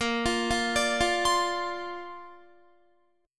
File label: A sharp Major Add 9th, Guitar; A#add9; arpeggiated; 17,20,17,19,17,20